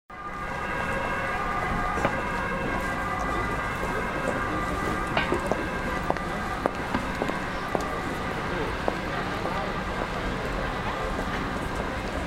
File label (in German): Stadtatmo Mic Sens High; Pocketrak CX